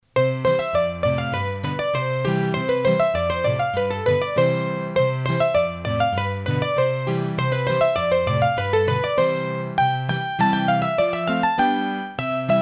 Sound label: Scottishe, hurdy gurdy; Massif Central